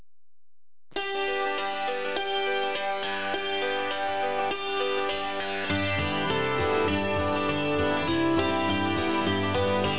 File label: Ding, Hammered Dulcimer